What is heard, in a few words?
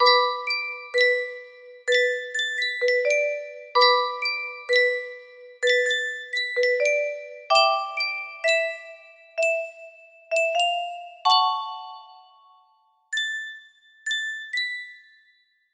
music box melody